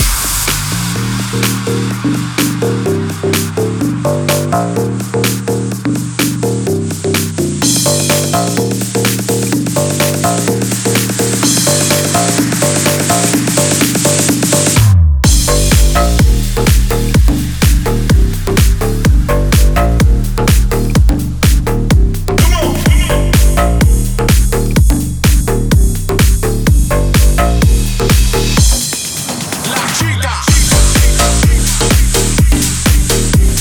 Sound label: Snow MIX G, 126 BPM, Future House; Big EDM; Future House Tools; Construction Kits